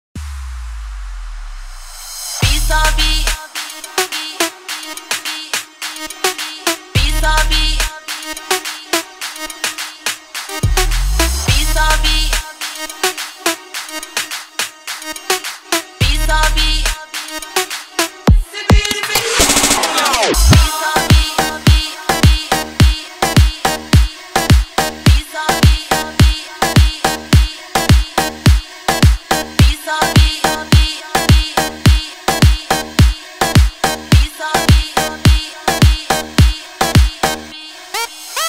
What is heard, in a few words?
arabic remix balkan remix arabic vocal mix